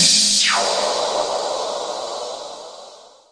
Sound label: Electro FX; Ueb HE Electro FX